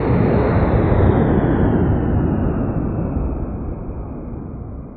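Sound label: vehicle jetpack decel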